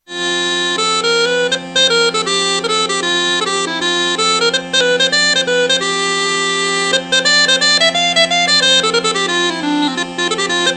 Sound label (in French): cornemuse de northumberland; instruments